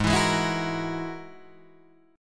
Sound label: Ab 7th Suspended 2nd Sharp 5th, Guitar, Drop D